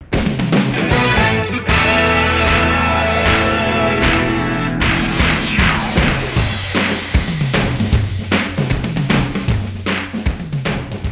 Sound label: Sports Open NO SHOUTS NO FIGHT SONG; KSL; BYU